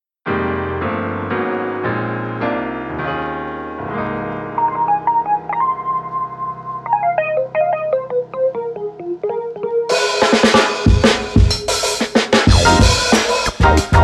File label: slow fi funk